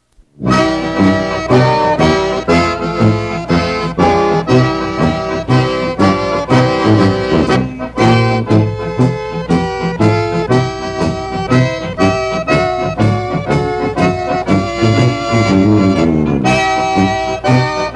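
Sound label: accordion and tuba polka; Accordian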